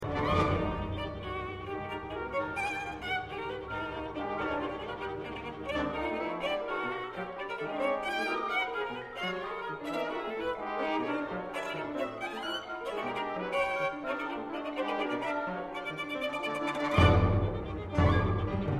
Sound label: Violin Concerto